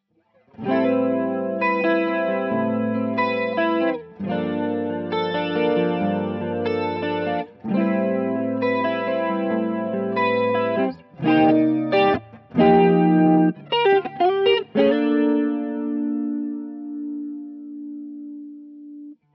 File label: Boss DC 2 Mono